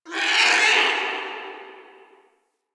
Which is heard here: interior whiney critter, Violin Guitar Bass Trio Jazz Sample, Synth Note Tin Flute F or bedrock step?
interior whiney critter